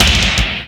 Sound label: hit, IMPACTS & HITS; Techno Trance; FX & ATMO; Techno Trance Essentials